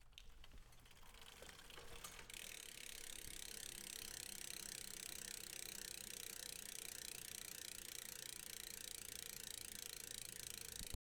Bicycle Wheel

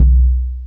Surdo

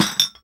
Box Of Beer Bottles Being Kicked

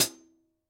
Hihat Closed

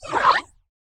Creature Voice Dry

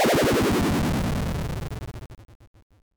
Lose

